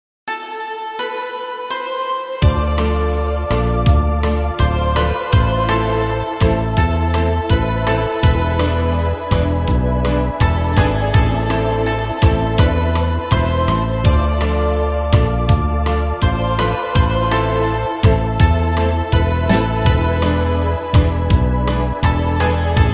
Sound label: brass minus